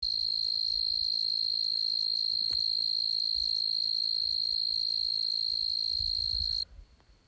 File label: song